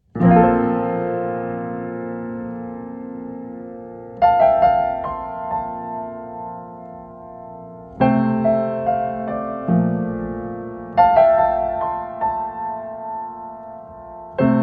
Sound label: relaxing piano music